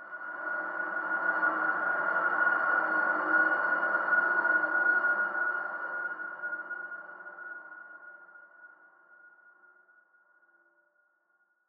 sonar Ambience Shuttle; Barotrauma; Real Sonar

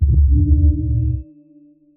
sonar Ping Air Medium Directional; Barotrauma; Real Sonar

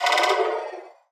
damage; Barotrauma; Barotraumatic; Legacycrawler

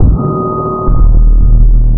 sonar Ping Suit Very Close Beacon; Barotrauma; Real Sonar

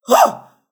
grunt; Barotraumatic; Clowns; Clowndeathmask

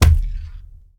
footstep; Barotrauma; Barotraumatic; Sentinel

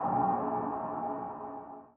sonar Tail Air Close Shuttle; Barotrauma; Real Sonar

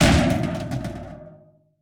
duct Break, Door; Barotrauma

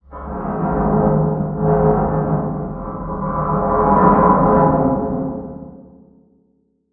Hull; Barotrauma; Room FX

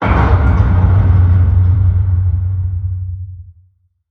Structure Blunt; Barotrauma; Room FX

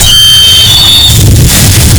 sonar Ping Water Very Close; Barotrauma; Real Sonar

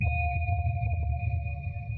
sonar Ping Suit Medium Directional; Barotrauma; Real Sonar

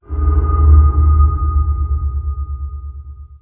Ambient; Barotrauma; Room FX

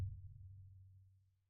sonar Tail Air Far Beacon Directional; Barotrauma; Real Sonar

